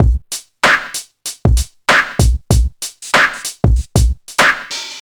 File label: 96 bpm breakbeat A sharp key, kick tuned to the A# note; drum loops